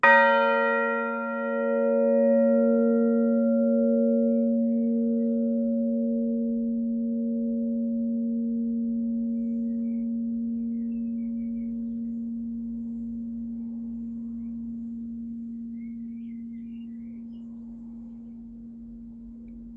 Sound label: billingshurst six, bells